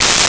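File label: Aerosol Can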